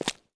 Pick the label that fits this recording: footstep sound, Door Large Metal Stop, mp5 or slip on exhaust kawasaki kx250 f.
footstep sound